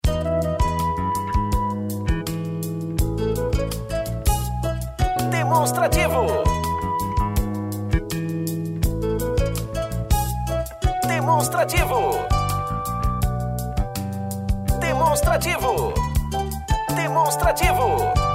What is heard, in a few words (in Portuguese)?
tema espera